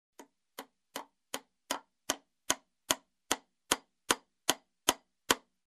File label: Hammering a piece of metal